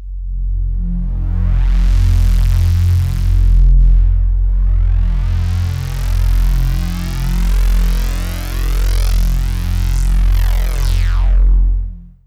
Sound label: FX SWEEP